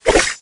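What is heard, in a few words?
shield skeleton attack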